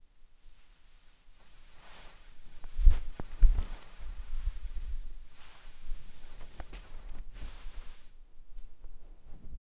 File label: light rustling of clothin, cloth